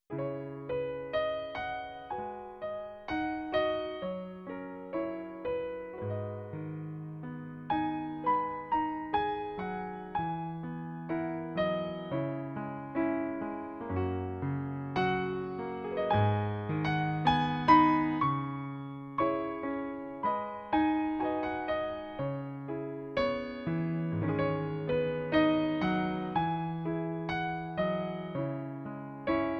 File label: Solopiano; Wondrous Love; Public Domain